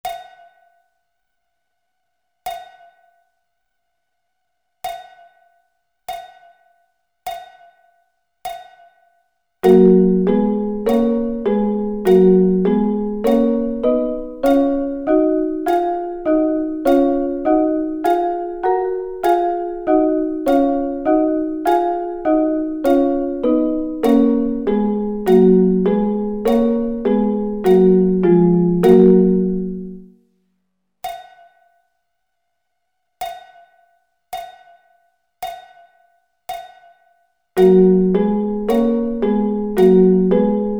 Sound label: Double Stops